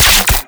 spark short

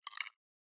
xen infest click; infestation; Half Life Alyx

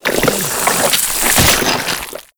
juicing, antlion; Half Life Alyx

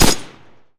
combine mg mid; weapons; Half Life Alyx

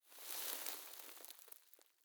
sand basket; ambient; dust2

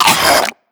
choke, zombie vox; creatures

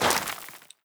scuff, footsteps, gravel; player

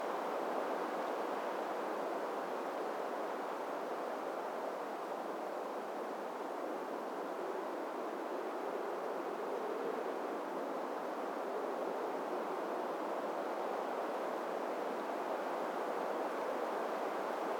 exterior, ambient; nuke

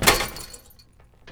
metal chainlink, footsteps

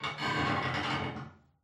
ambient wood creaks

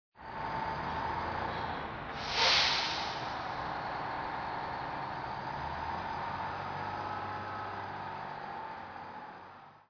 truck drive; Counter Strike Model Pack; CS:S; ambient misc

